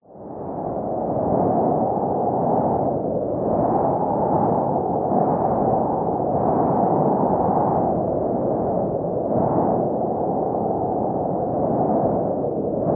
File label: nature wind strong stormy gale force howling